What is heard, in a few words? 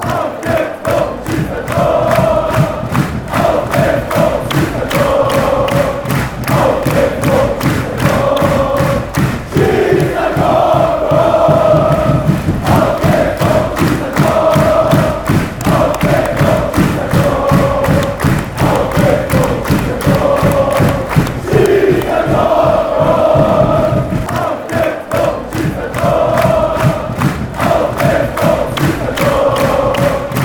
score goal fan chants